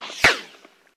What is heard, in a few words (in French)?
tir proche; fortoresse